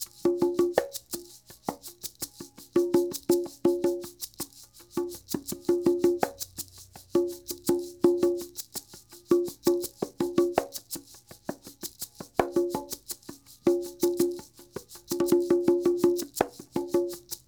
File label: Shaker Conga